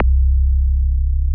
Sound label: MOOG C1, ANALOG BASS; Music Store - Claudius Diemeter Schwerlos